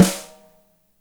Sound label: DRY SN; Spectrasonics; Burning Grooves; BG SNARES 4